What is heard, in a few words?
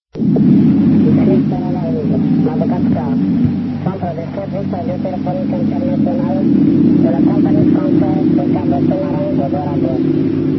usb